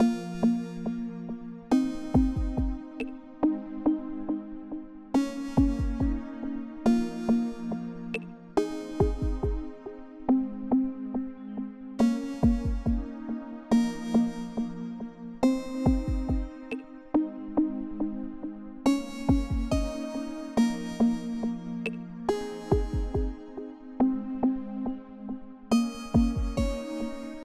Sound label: cave